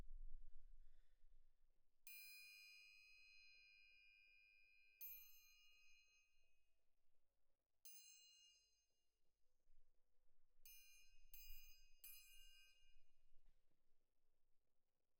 Perc Main

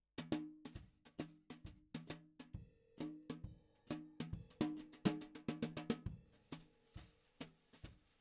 SNR top

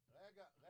Floor

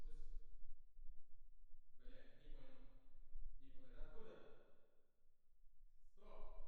Perc

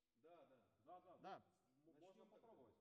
Bari, RNR for piano

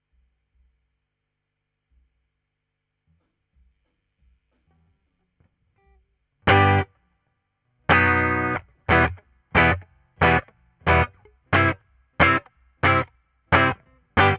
GTR, RNR for piano

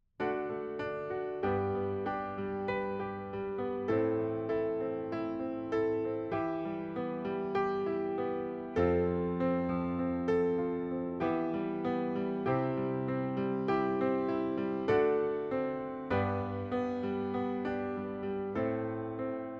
Piano B

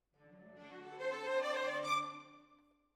Violins, jazz for piano

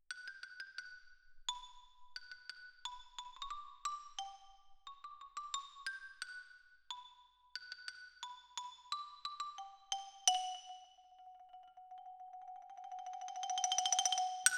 Xylo

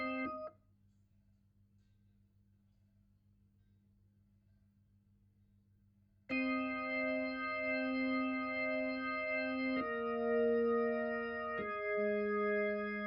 Hammond Leslie

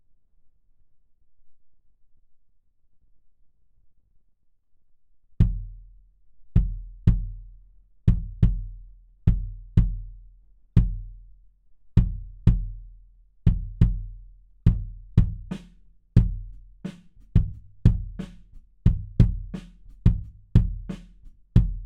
Kick In

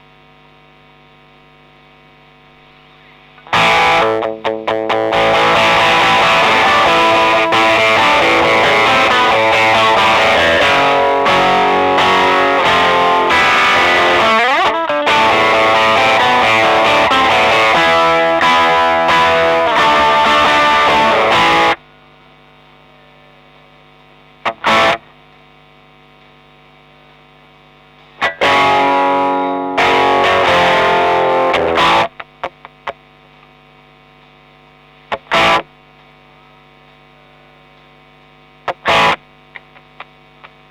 OD E Gtr

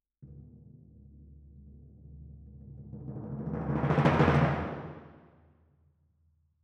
Timp Main

